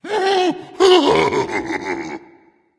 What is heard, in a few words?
laugh berserk; cuchulainn